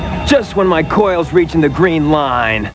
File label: coil